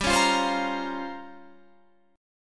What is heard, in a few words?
A sharp Minor 6th Add 9th G, Guitar chord